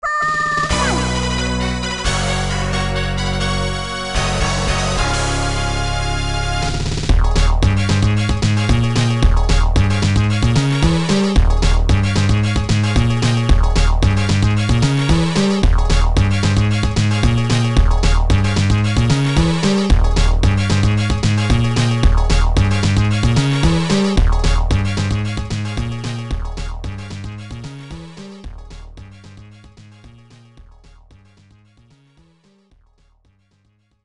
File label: Homerun; super world stadium 92; namco system 2; arcade